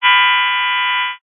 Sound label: science fiction radio receiver transmission interference burst buzz high pitched